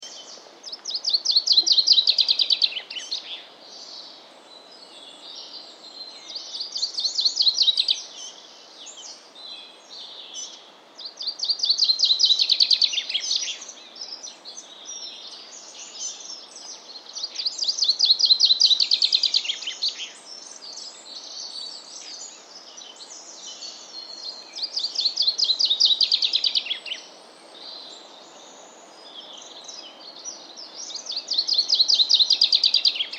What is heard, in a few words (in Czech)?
penkava obecna Fringilla coelebs